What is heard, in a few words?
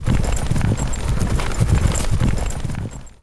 Horse Loop; warcraft3; knight